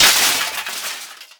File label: Armour Hit Fall Off; SFX Kit; Creaks, Scrapes, & Misc